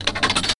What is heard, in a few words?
Lever